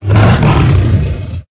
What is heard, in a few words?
dragon